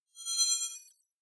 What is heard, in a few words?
hologram appear, energie